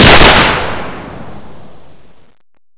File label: k43 shoot